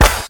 2000s dry hip hop acoustic snare sample f sharp key